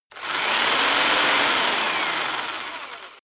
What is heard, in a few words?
rock drill rev high long; bohrer; soundpool